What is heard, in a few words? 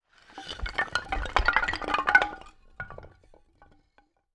Rather Woody Sound Pitch Down